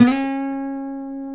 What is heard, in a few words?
MARTIN 6, Guitars